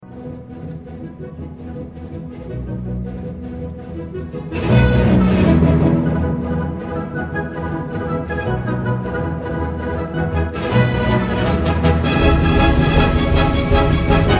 william tell overture

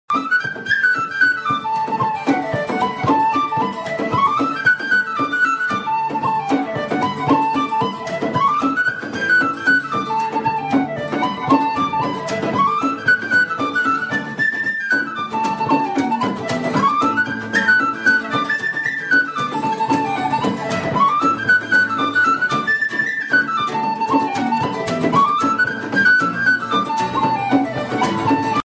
irish ringtone

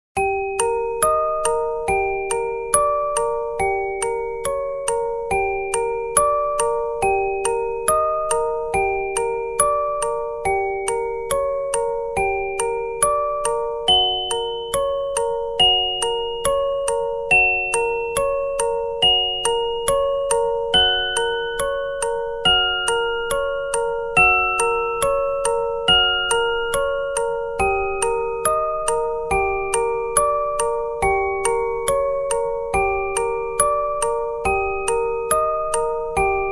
Creepy music box

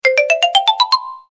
marimba tone

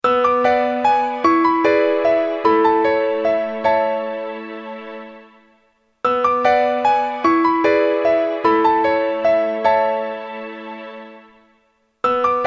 Nokia tune piano

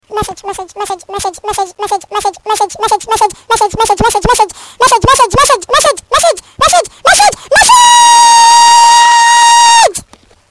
annoying text message sound

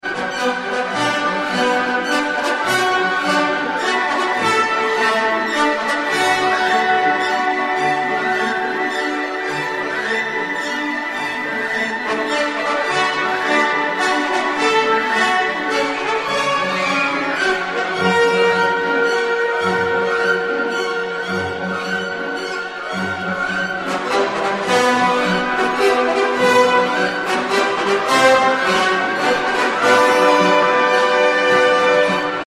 ride of the valkyries brass

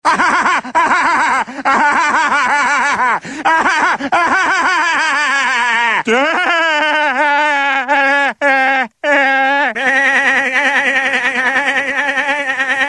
laugh; peter griffin

